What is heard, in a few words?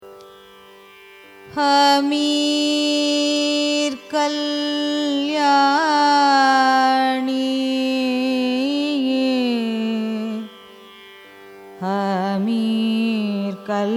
raga hamir kalyani signature; carnatic music